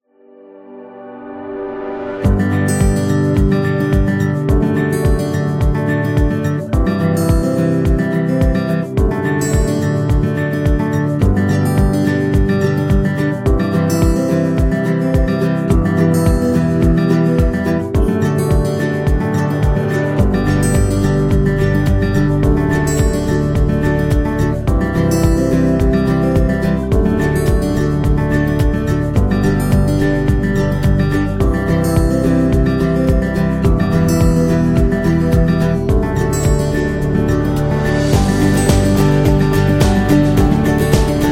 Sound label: Cheerful Corporate, corporate music; corporate music vol 10